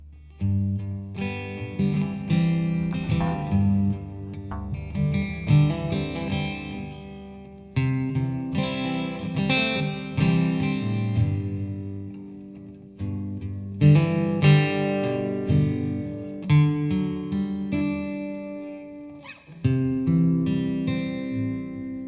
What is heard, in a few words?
Jam